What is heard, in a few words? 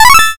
level up